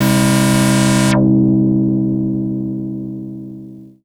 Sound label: SYNTH